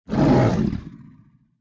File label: Monster; Zenless Zone Zero